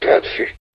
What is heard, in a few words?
jingle